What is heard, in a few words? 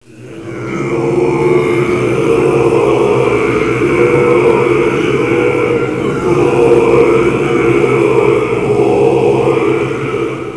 litania